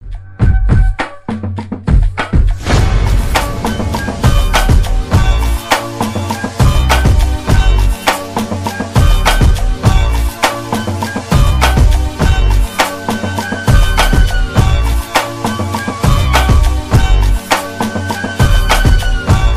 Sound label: ring ringtone